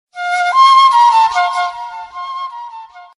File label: flute sms ringtone